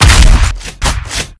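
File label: double barrel, shotgun; doomshotty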